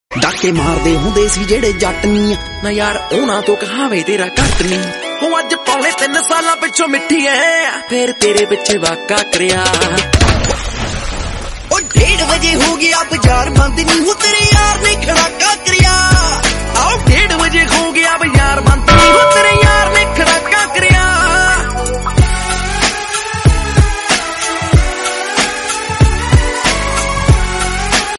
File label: attitude instrumental band